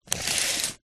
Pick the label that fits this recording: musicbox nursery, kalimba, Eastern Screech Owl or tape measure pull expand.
tape measure pull expand